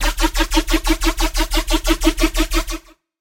SFX, Serum 2; SFX - Laser Launcher; Routed Sound; Serum 2 Expansion - Quantum Enforcer Vol.1

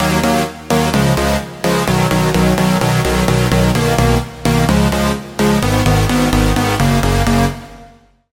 Chord, Sylenth1; Chord - Neutron Star; Routed Sound; Sylenth1 Expansion - Star Scavenger Vol.1

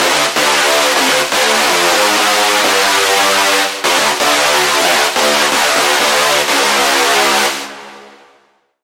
Lead; Super Pumped; Routed Sound; Vital Expansion - Chaos Bringer Vol.1

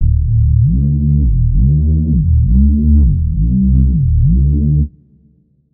Slow Vibration; SFX; Routed Sound; Spire Expansion; Chaos Bringer Vol.1

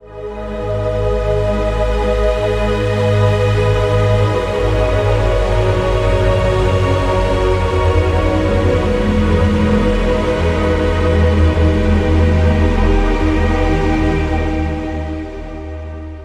Pad; Routed Sound; Spire Expansion - Doom Carpenter Vol.1

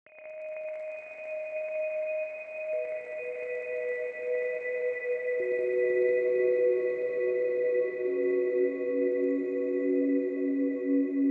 Pad, Spire; Routed Sound; Spire Expansion - Star Scavenger Vol.1

